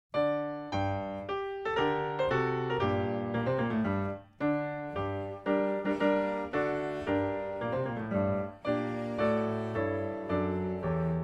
Piano; Suzuki Cello Vol 2; The Two Grenadiers

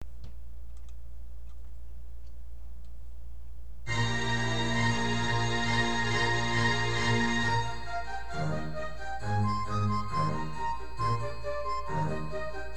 Can Can accomp; Grade 10 Class Exam Solos; Xtras Classical Favorites

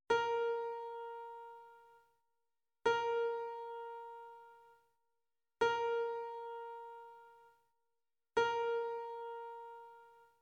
Tuning; Grade 10 Class Exam Solos; Xtras Play Mozart

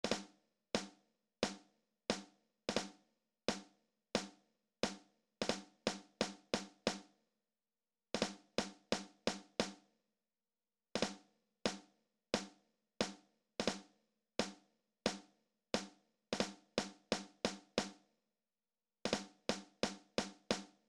For Snare Drums Only

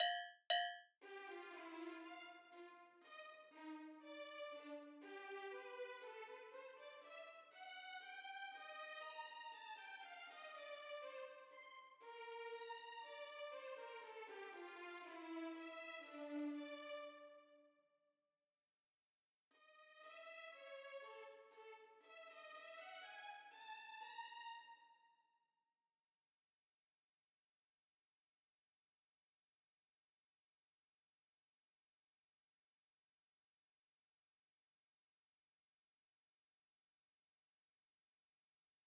Oboe Classical

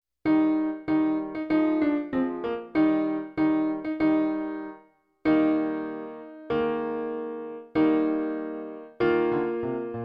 Tpt, Tuba; March

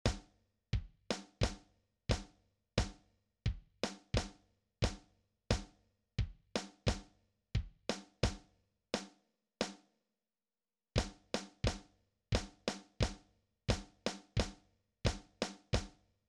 Warm-Up, drums

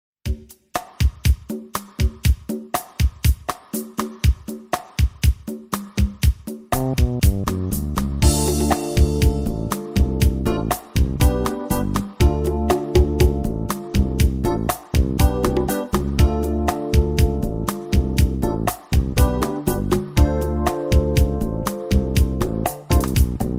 tenor sax

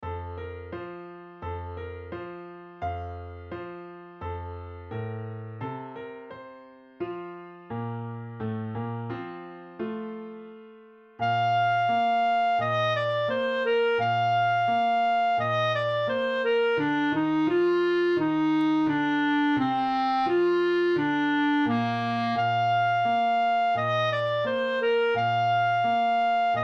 Clarinet Solo; Mussette Clarinet

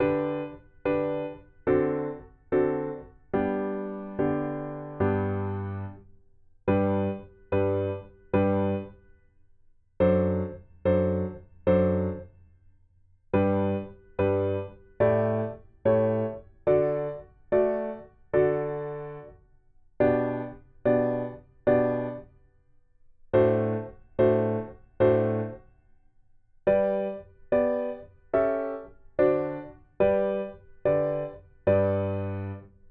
Witches Dance Slow Acc, Cello; Suzuki Cello Vol 2

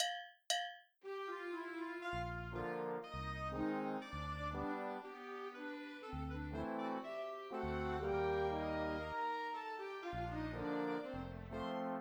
minus Bass Clarinet Classical

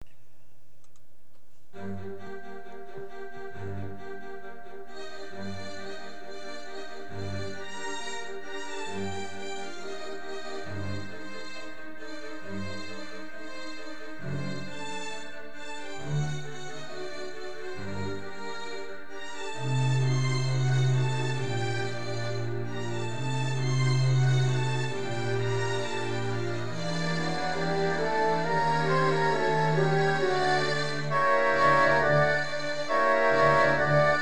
accomp; Symphony 40; Grade 10 Class Exam Solos; Xtras Classical Favorites

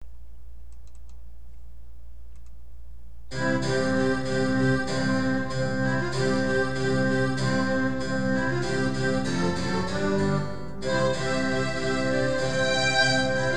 Spring accomp; Grade 10 Class Exam Solos

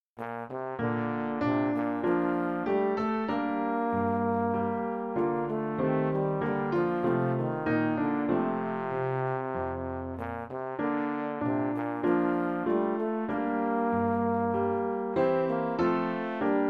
Trombone Bouree

